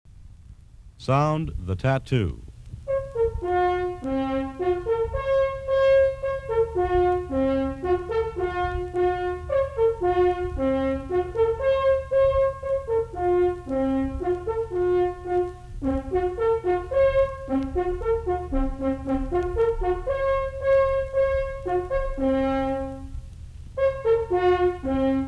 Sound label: The Tattoo Bugle